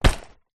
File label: foley lunchbox fabric set down